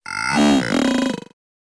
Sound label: Toy robot talking